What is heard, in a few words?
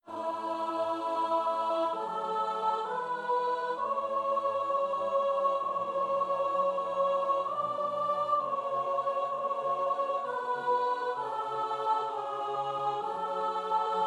Psalm soprano